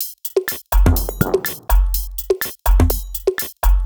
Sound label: Top D 124bpm, House Drumloops; Zero-G House Fabrik Drumloops